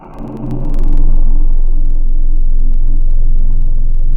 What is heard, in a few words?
Bottle Blow; Digital; Synthetic's Serum Collection